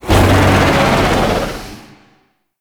Void Dragon Vocal Pain; Enemies; Vocals; Warframe; Duviri

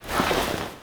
Foley Wake Up; Orbiter Cradle; Tenno; Cinematics

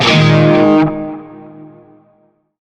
Shawzin Yo Chord; Warframe; Narmer

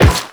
Run Metal, Footsteps

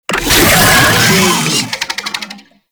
Summon Vocal; Warframe; Uriel; Catenach

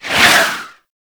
Heavy Orbital Strike Whiz By

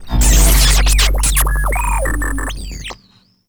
Holographic Decoy Ring Ability Cast; Infested Lich; DJ Rom

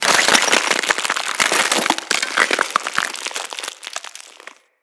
Freeze Ray Frozen; Weapons; Corpus

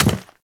Step Default; Warframe; Ballas Chimera; Steps

